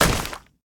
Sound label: footstep; smite; raijin